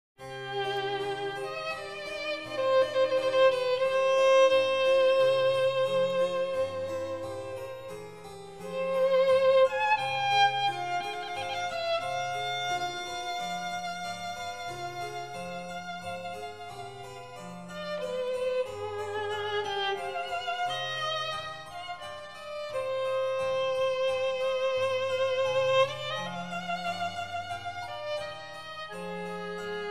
sonata dlja skripki i klavesina do minor siciliano largo; BWV 1017